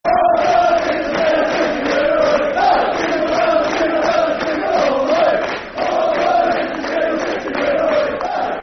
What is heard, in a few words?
Jingle Bells Football Chants (Soccer Songs); fanchants; Hull City Hcfc